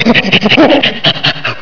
PANT

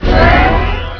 BEAM OUT

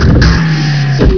KATSCHUU

